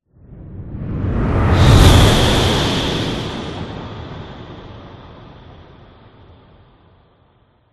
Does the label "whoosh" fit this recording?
Yes